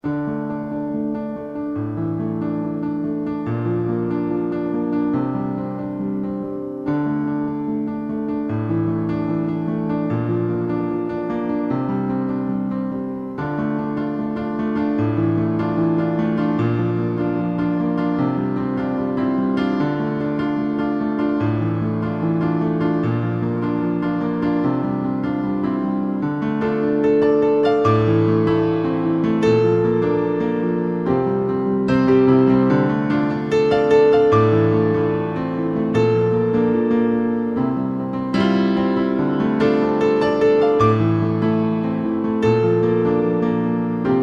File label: piano